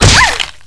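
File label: bullet hit flesh female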